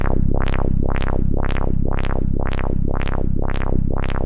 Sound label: BASS C2 L, Jungle; JGL BASS; USB Soundscan vol.05; Explosive Jungle; AKAI; 90s sample CD